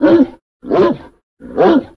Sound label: dog idle; STALKER